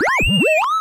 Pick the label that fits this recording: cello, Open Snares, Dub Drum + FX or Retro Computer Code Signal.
Retro Computer Code Signal